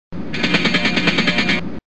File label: bionic running